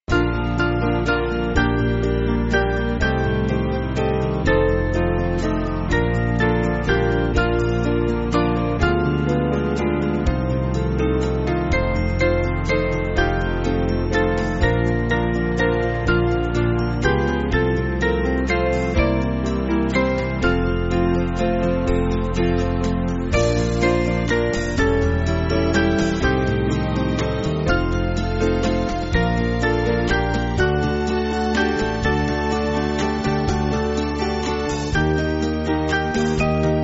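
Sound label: Band, Music Backing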